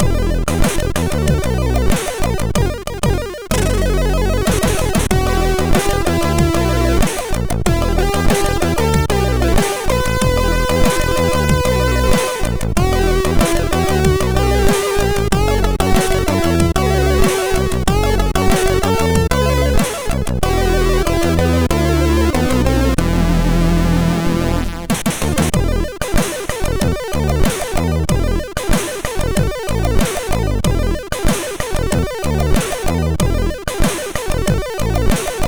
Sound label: Short Tune, sid MOS6581R2; hvsc; Spike